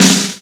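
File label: snare drum, Drum & Bass; Ueberschall; Drum & Bass Resonance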